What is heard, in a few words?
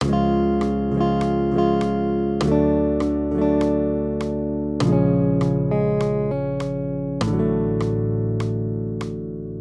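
Accompaniment, Major Triads, Db-D, 100 BPM, guitar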